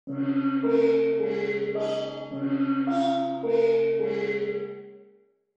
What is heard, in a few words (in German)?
Gong; Instrumentenkunde